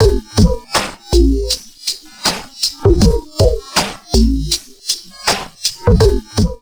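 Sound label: drum loops crazy processed, 80 bpm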